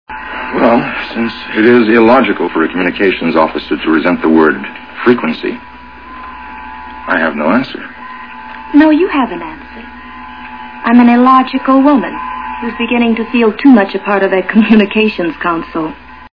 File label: frequency